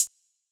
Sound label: hat, HiHats; drumkits; fl studio; dstarkels plugnb